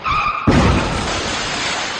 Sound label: o a car crash